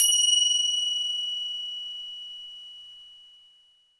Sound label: FINGER CY L, Synclavier Percussion